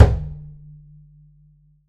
perc, pizz; meatbass

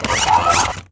glitch robot; drums; one-shots; glitchy

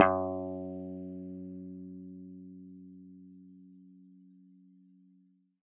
gb3 fff, swagbass

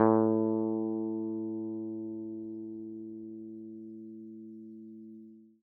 a3 open f, swagbass

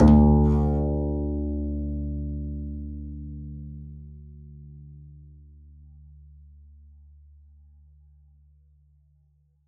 pizz d2, double bass

